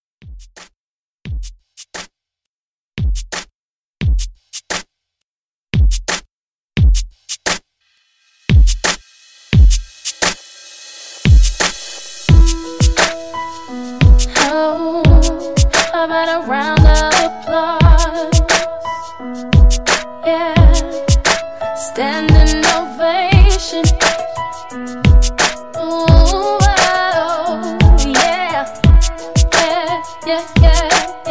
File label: 2k Pop Re Drum